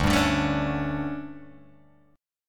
D Major 7th Suspended 4th, Guitar, Open G

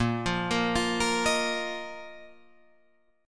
Bb Suspended 4th Bb down Guitar Open F; Bbsus4 arpeggiated

